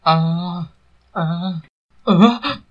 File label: pain; cstrike; radio